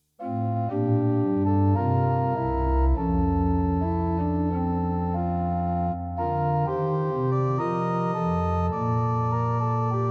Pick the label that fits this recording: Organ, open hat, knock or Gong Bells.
Organ